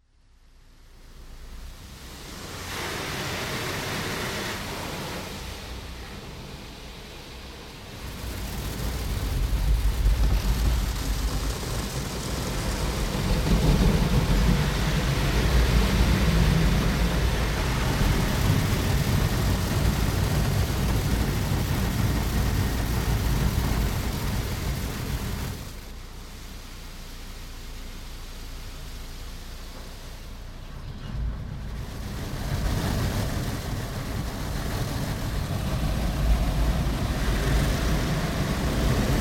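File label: Car wash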